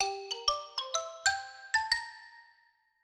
grover says licks; Sesame Street Ready, Set, Grover

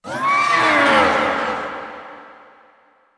Scream; the legend of zelda twilight princess; Zant

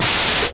Noisetv

